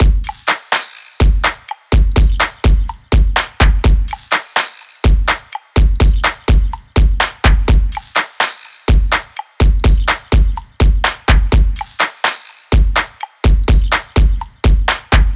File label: drum loop 125; Pop; Drums; Full Drum Loops; Slate; SD PASADENA Pop Sample Pack